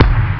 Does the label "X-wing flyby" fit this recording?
No